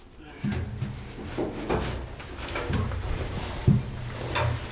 Vehicle reloads; Red Orchestra: Ostfront 41-45

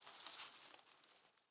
leaf rustle crunchy soft

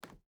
footstep barefoot wood walk; Rust

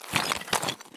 turn tile; Escape from Tarkov

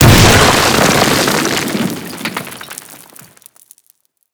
detpack cement; Call of Duty Modern Warfare

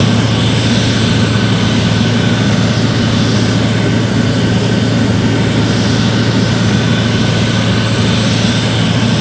jet rear, engines; War Thunder

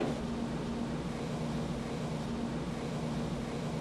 Flaps Hydro; DCS

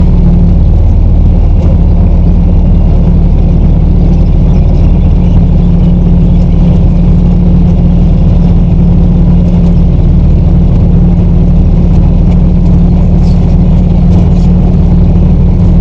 Vehicle Engines; Red Orchestra 41-45

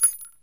weap round out, weap; Escape from Tarkov

